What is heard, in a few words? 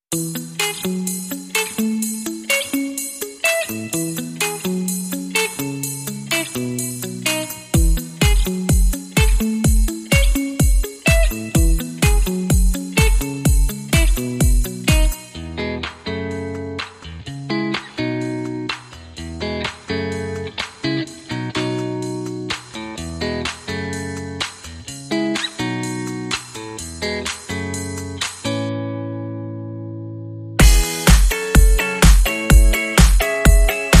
Main Beat and Funk Guitar Only